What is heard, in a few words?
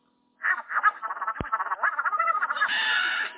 complain and scream